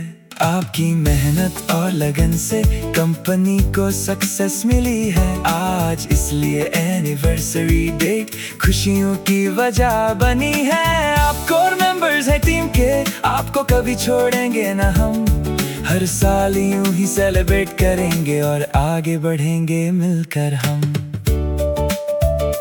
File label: Anniversary song; corporate